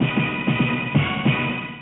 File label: Round Complete; snow bros. 3 magical adventure toaplan gamerip arcade